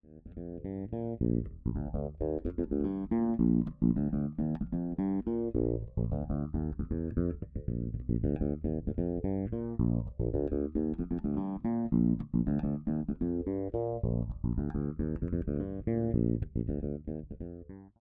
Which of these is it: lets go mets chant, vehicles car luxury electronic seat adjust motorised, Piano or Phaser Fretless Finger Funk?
Phaser Fretless Finger Funk